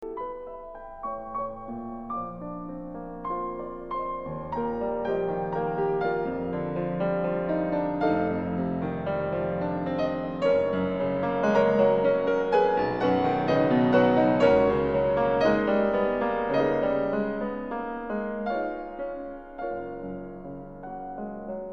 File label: c sharp minor